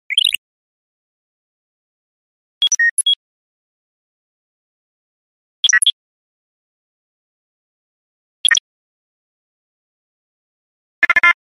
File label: computer interface; radio signals